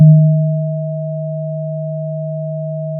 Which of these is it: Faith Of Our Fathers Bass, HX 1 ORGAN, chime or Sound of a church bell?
Sound of a church bell